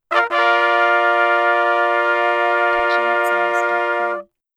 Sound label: Goofy Raid Host